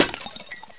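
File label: shatter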